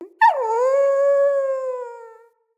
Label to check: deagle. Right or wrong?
Wrong